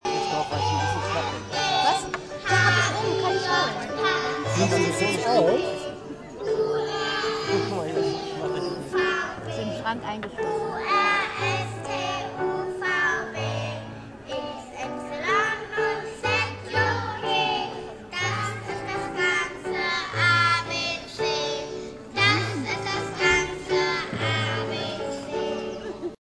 Kita St Matthias singt ABC

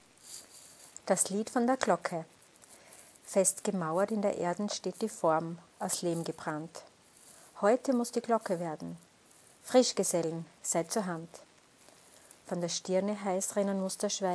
glocke

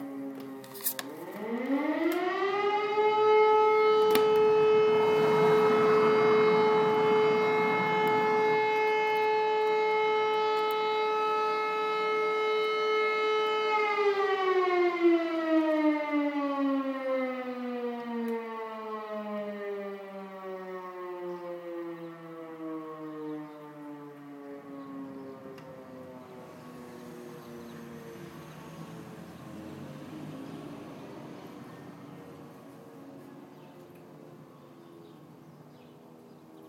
sirene in zeilitzheim

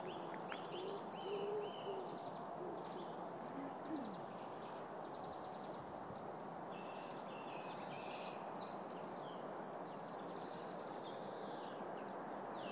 vogel am bahnhof

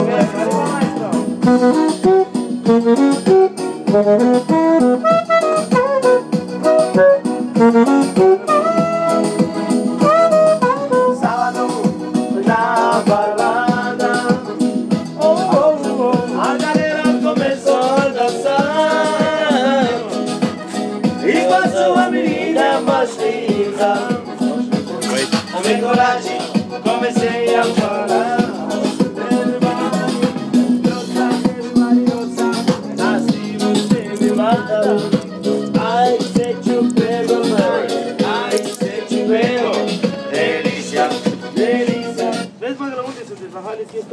u bahn musiker

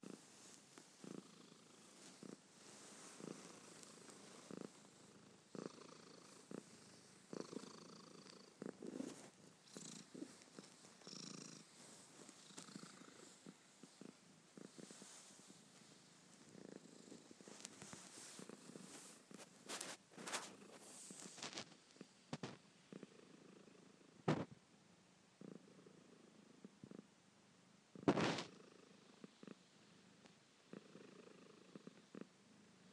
schnurrrrrrrrrrr

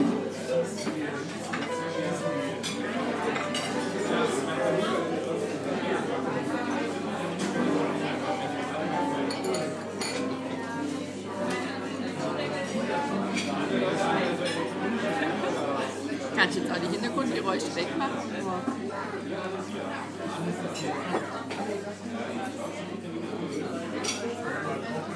Frühstück mit live Klavieruntermalung im Café im Hinterhof

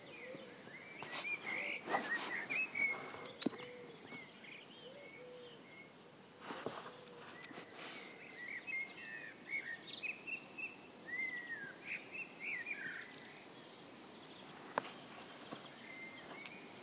Vogelgezwitscher im Schlossgarten

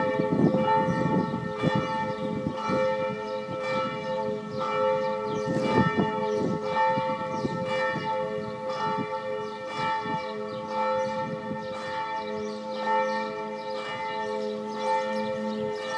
Zeilitzheimer Glocken

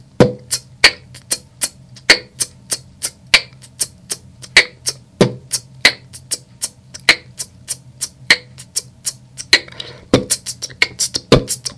Langeweile beatbox

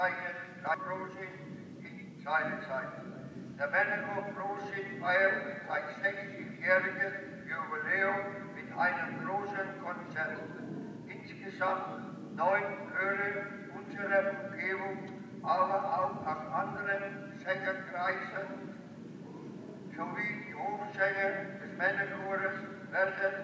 Männerchor; frohsinn

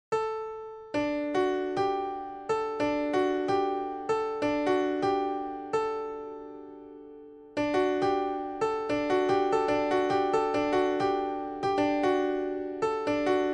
Klavier

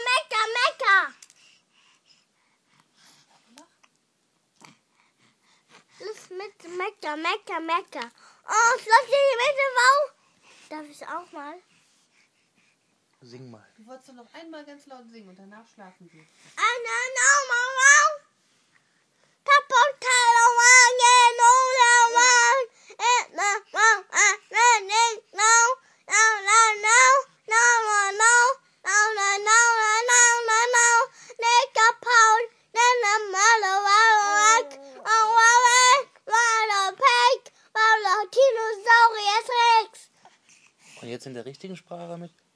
Schlaflied

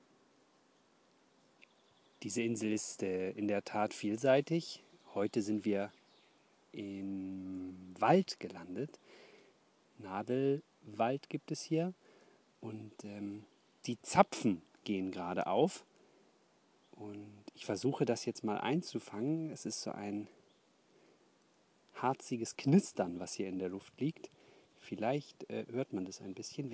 waldgerausche fur hinhorer

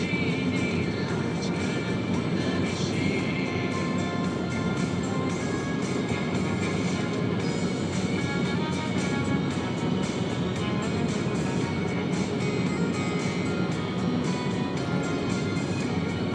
schlimme ruckfahrmusik

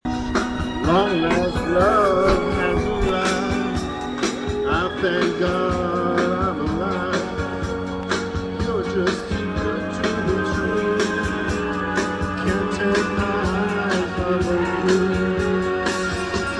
karaoke im mauerpark

